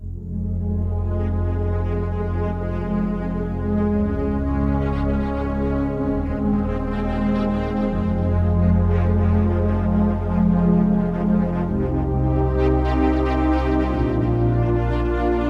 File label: Waving Strings; Roland VC-1